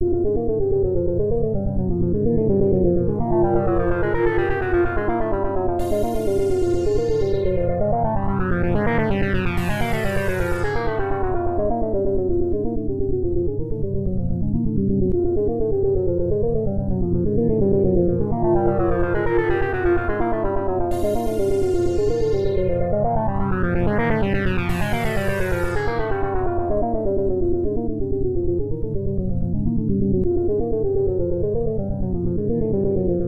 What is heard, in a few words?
moog; the warriors 2005 ps2